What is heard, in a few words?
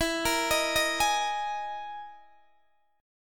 E Major 7th Flat 5th E down Guitar DADGAD